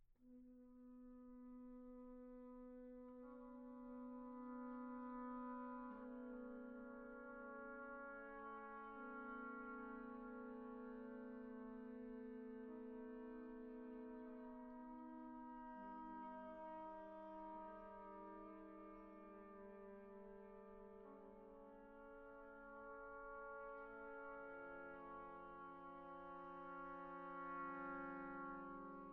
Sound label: Brass Quintet - Mvt. 2. Ethereal, disembodied; American Visions